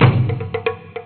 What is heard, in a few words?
J PERC LP, PERC LOOP; USB Soundscan vol.60; AKAI